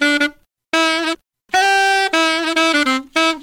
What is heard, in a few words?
sax; Phonky